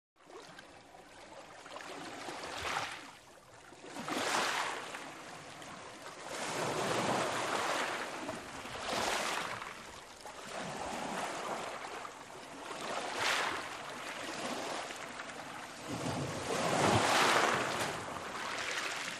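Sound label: Water Lapping Closeup, laps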